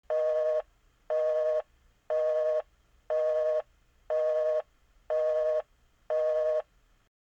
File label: phone busy